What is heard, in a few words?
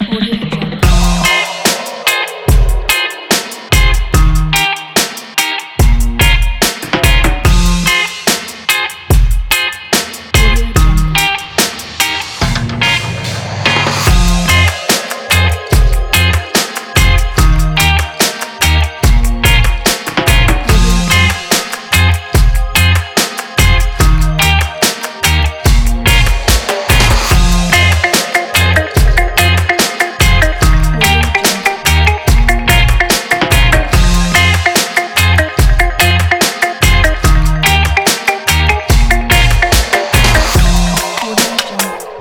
the reggae